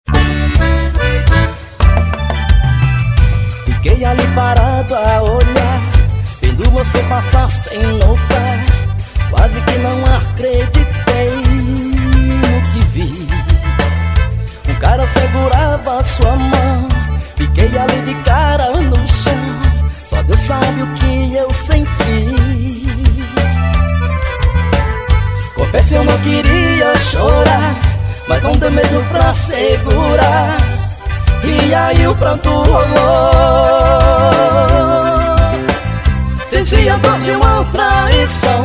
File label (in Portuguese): forró pesado